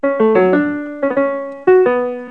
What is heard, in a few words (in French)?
clav, modifications de timbre